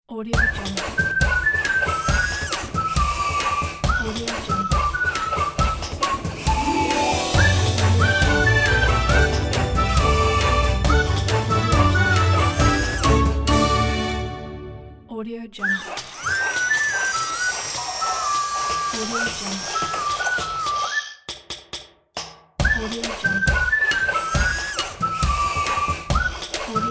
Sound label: santa klaus toys factory opener; unitedsoundacademy